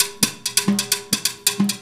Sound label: Tmbl Ptn, Loops & Phrases; Roland BK-5